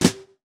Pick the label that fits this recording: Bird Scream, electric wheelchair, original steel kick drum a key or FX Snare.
FX Snare